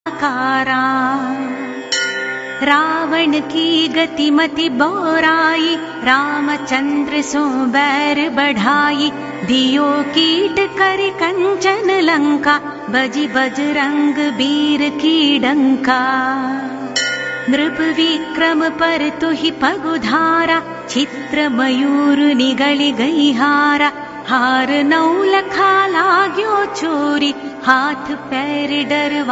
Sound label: shani chalisa; deities